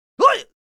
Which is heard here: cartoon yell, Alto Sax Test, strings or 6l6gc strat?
cartoon yell